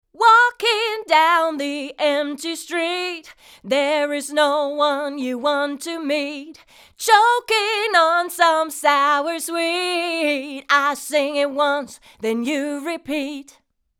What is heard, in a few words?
TritonAudio D2O Mono Vocal Tube Fet LO